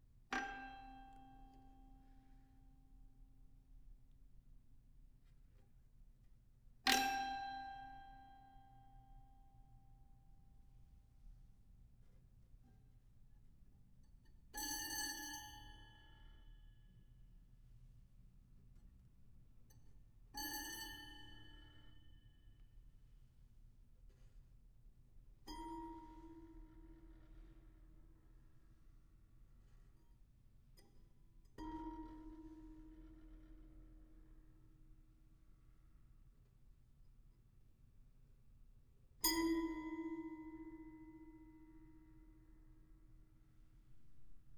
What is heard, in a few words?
rod noises; razzo